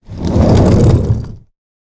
Door

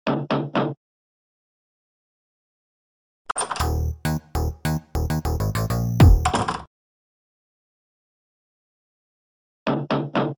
Instrumental

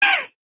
beast sound

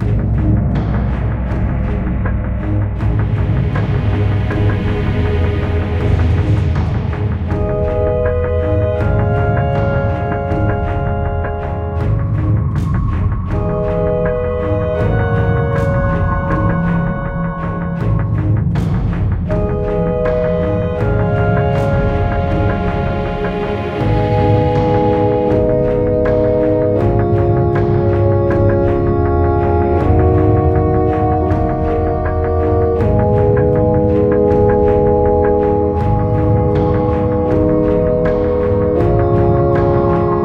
Build Up Spires Finale Low, gamerip

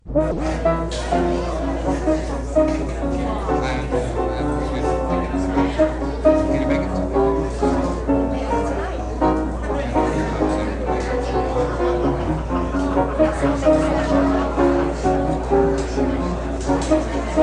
Annoying Piano; cowboy casino 1994 gamerip 3do

